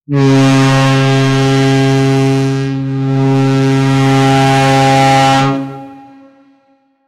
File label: giant ship horn; nautical